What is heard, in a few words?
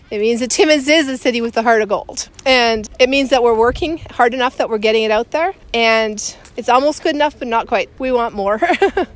organ